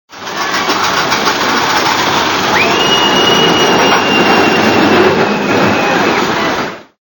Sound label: roller coaster